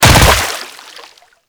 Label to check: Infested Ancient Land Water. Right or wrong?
Right